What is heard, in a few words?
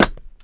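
player metal, footsteps; csgom; cstrike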